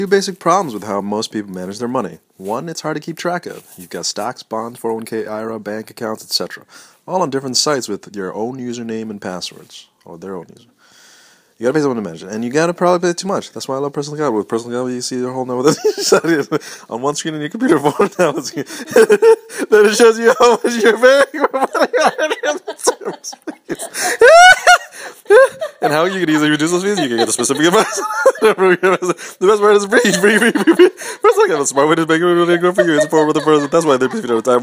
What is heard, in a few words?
Laughter